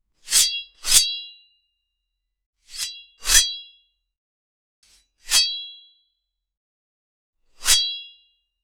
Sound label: swing sword at metal